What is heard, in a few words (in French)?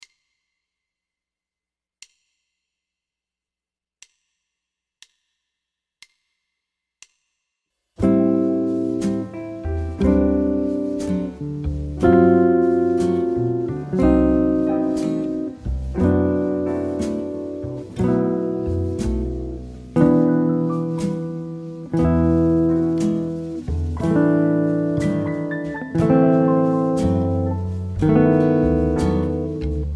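autumnale voicing tierces et septièmes cordes 3 4 avec enrich sophist, guitare jazz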